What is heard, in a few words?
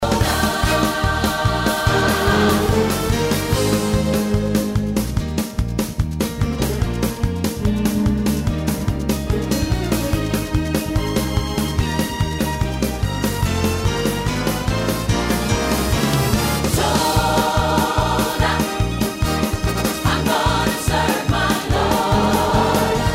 Melody